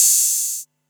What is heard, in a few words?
Hihat Open